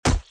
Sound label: stone body